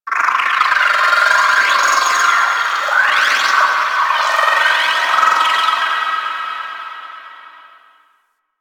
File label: Orphix Shield Pre Disable One Off; Warframe; The New War; Sentient Devourer